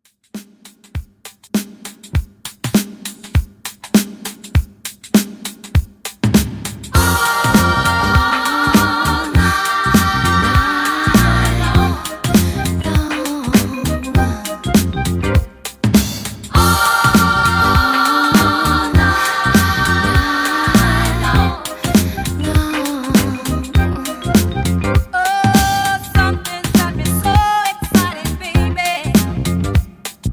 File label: 80s Extended Mix 100 Bpm